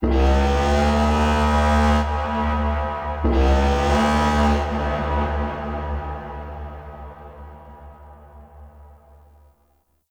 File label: Balloon Enemy Alerted Alarm; Encounters